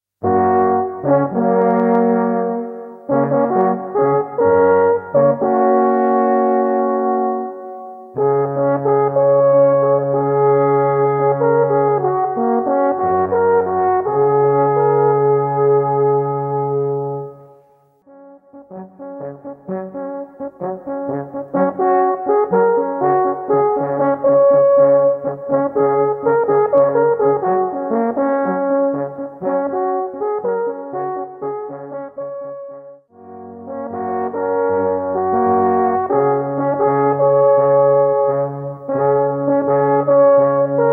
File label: Alphorn Solo; faulhorn schynige platte; Alphorn-Solo-bis-Trio